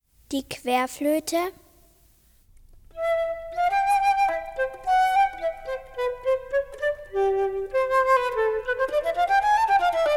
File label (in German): Querfloete; Bilder einer Ausstellung